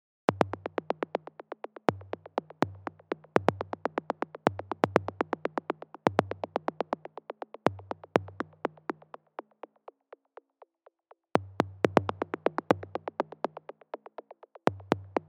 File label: 122 BPM Clicks Rythms; mmb drum arsenal vol 1